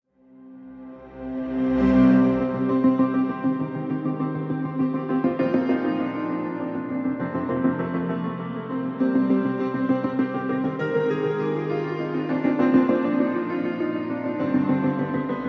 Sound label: coastal explore piano; rogues like us